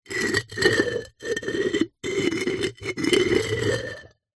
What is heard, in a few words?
household pot ceramic base lid scrape